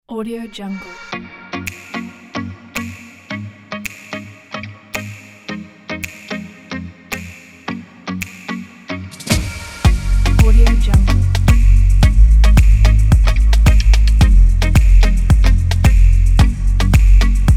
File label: beach house pop; bluenotesounds